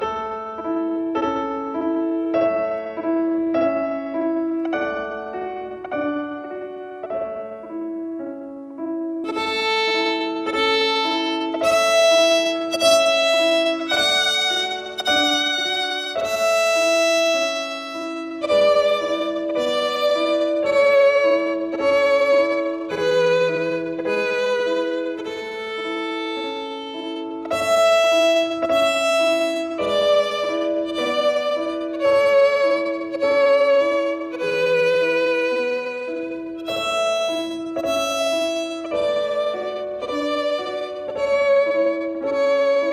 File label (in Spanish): Twinkle Tema Muy Lento